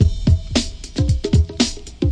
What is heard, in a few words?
rounded conga, drum loops and breaks